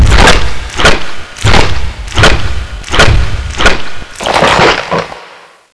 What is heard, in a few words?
megalodon attack run; Boss Megalodon